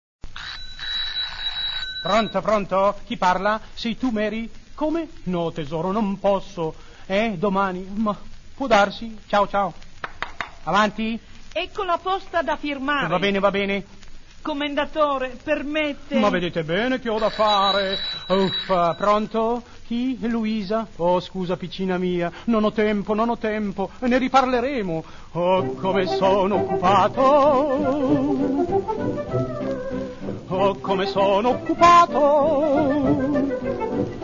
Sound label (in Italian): CORO